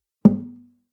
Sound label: nylon guitar drum single tonal